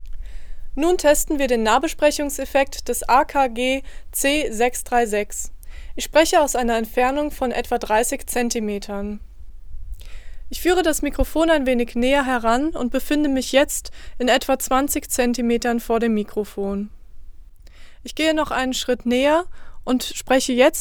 akg c636 nahbesprechung kompensiert, Gesangsmikrofon; akg c636 test

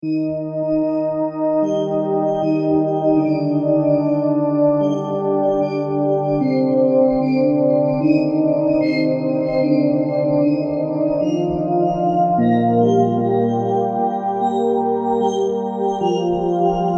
pad 1 instanz; camel audio alchemy testbericht

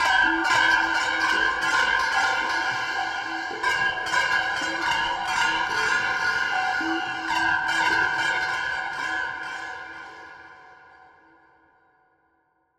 granulare synthese; klangsynthese

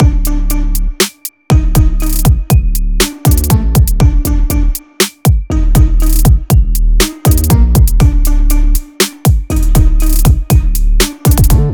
808 in beat; Der ultimative 808 Producing Guide

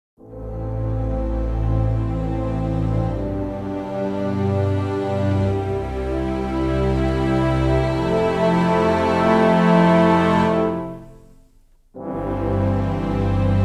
orchester ohne hall

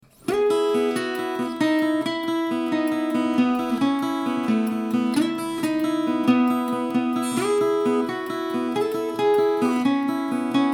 gitarre

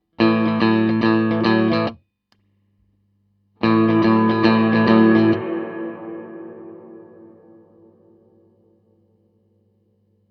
ampeg gvt52-212 federhall

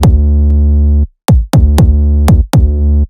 2 kicks vor 808; Der ultimative 808 Producing Guide

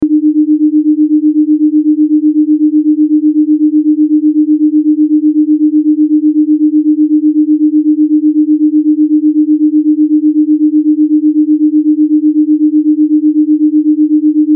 binaurale beats alpha waves 8 Hz

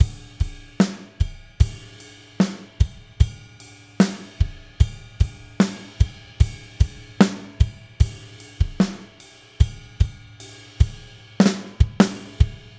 drums normalisiert kein fet compressor

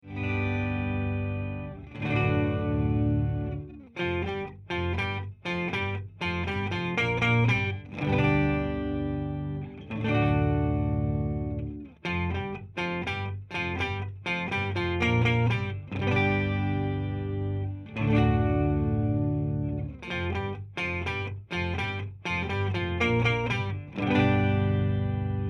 Sub N Up octaver gitarre clean polyphonic; tc electronic

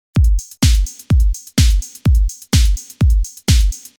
snare beats bauen synthesizer

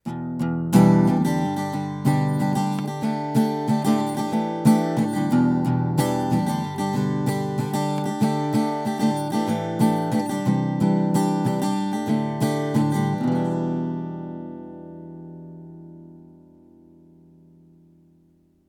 effekte a-gitarre neve 1073 hi-z pre 65db

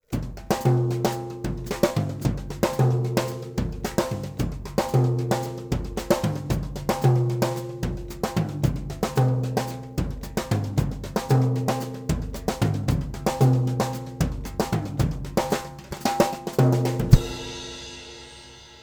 img stageline drumset toms und snare mit rods

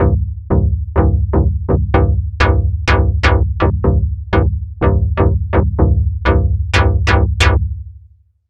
fm synthese showcase; yamaha

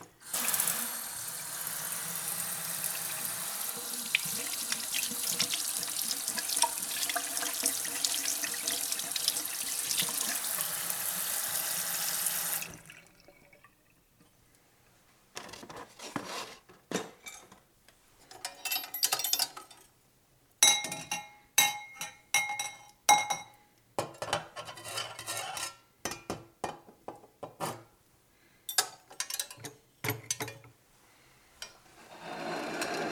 kueche; olympus ls-5

